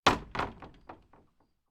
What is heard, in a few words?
screen door close